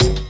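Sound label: Kick Dirtstak, One Shot Drums; Funk; Sonic Foundry (Sony Creative Software); Synchro Funk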